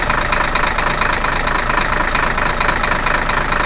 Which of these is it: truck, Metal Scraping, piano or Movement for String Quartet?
truck